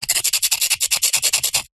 rock knife tip scrape scratch fast; foley

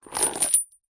industrial chain medium sized pick up from wood

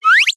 cartoon slip trip or fast ascend

